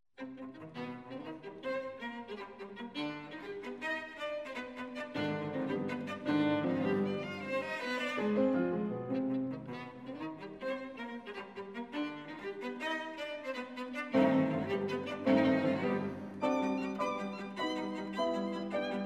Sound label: trio; suk